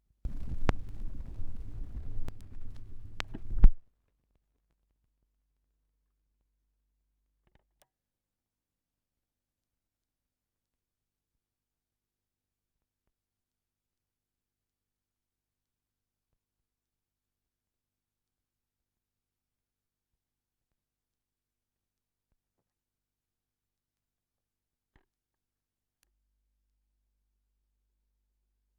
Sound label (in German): schallplattengeraeusche, 78rpm